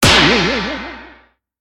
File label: Hit Ironclad